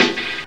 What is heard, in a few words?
SD, Dance Mega Drums; Best Service; SD-HIP